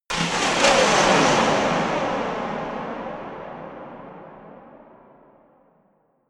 dropping metal

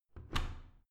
washing machine modern close soft

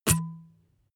glass bottle drink stop

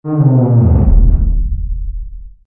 moaning elements

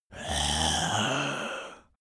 zombie moan idle throat

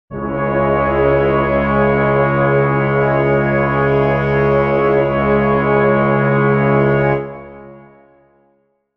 brass chord c sharp minor

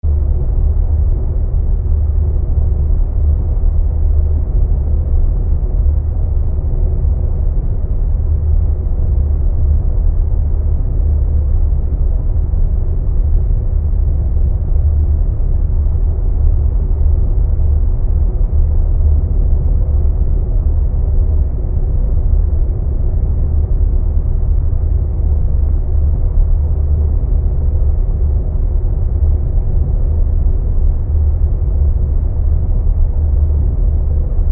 deep noisy subsonic atmo

